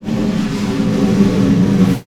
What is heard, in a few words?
Snare Drum; Percussion Samples